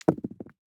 DICE on Felt, Throw and Roll, Standard, 3 Three Dice; Samples; ArticulatedSounds; DICE Sfx Party (Extended)